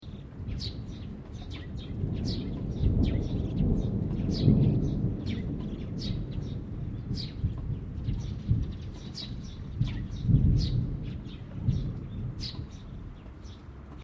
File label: THUNDER DISTANT LOW BIRDS; Elemental weather effects